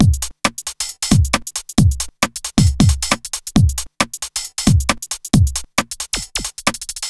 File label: tuff beat